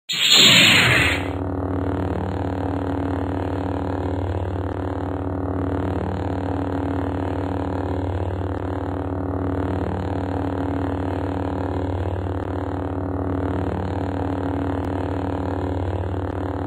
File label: Lightsaber; Star Wars